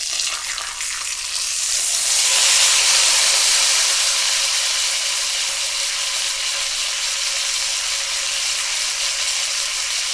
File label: grilling; cooking